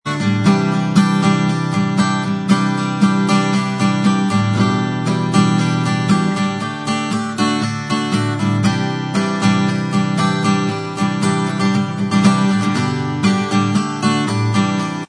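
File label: acoustic instrumental track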